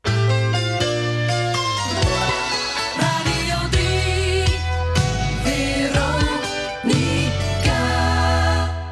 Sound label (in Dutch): omroep jingles